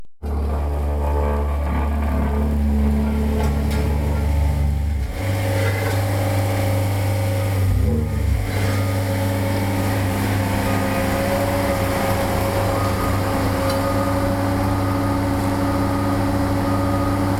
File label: Steamroller